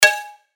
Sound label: Pluck Shot G#; synth shots tech house; Silver; revealed